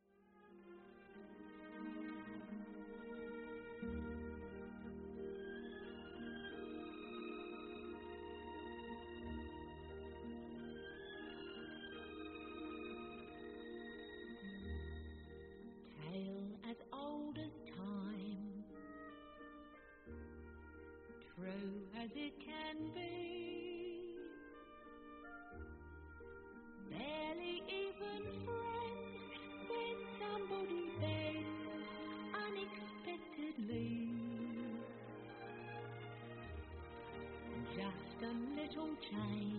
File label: dance off old time